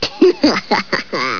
laugh

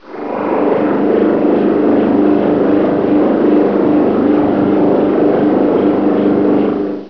particles

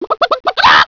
chicken

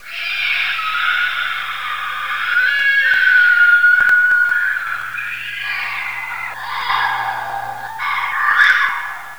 scream

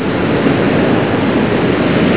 harbour

